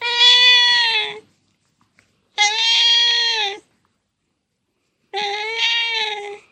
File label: cat sweet voice; animals